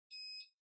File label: Ceiling Light Far Beep